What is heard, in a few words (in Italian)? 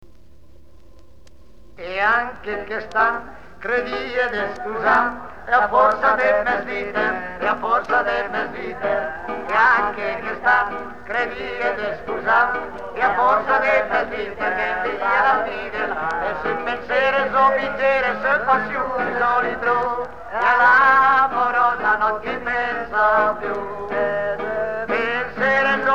canzoni da osteria; brescia; E ànche chest'àn credìe de spusàm